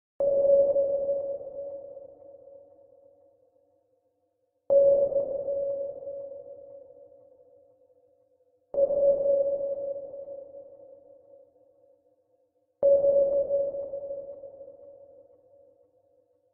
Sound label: low sonar ping echo